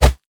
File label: Crit Attack; LoL; DrMundo